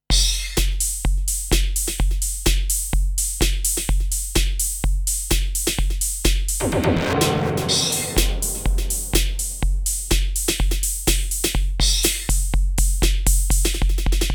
Alesis DM Dock Electronic Kit

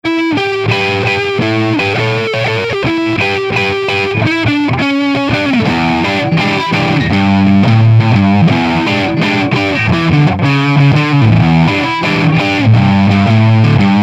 Neck Bridge Overdrive; Luna Sol

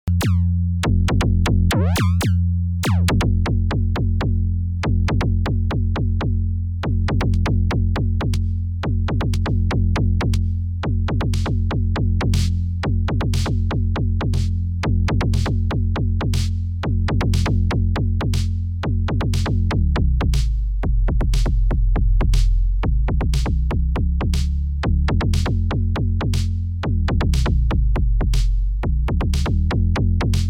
Boing Filter Beat

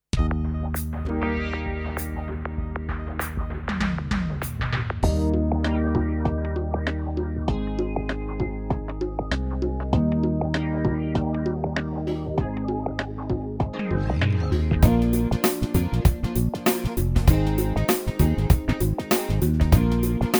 PA600 Styles

